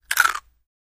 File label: Corn Nuts Crunch